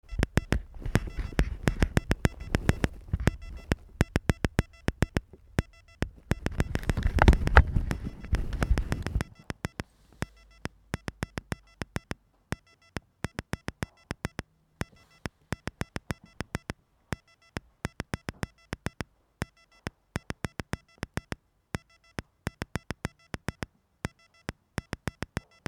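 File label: morse code sequencer triggers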